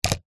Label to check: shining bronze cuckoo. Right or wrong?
Wrong